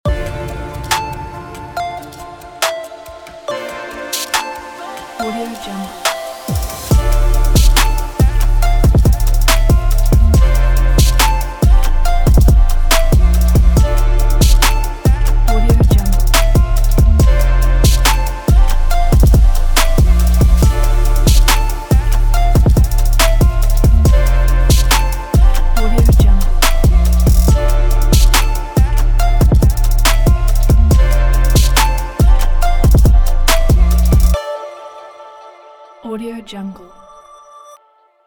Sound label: dark trap